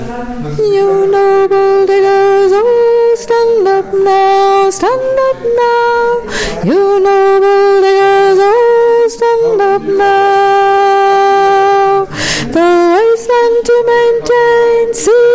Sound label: Tenor; Diggers Tune